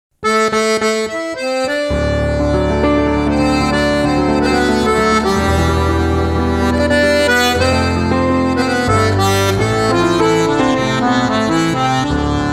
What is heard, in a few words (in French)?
Gavotte Bal